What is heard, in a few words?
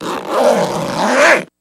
Zipper Zip Down Slw H; zippers